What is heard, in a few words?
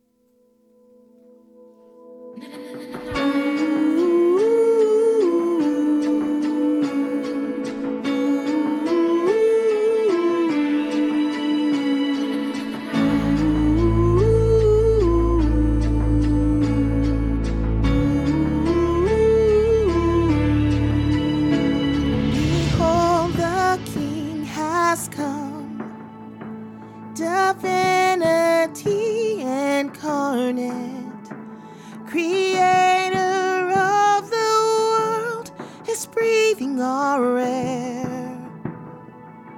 Full Choir; Christmas 2025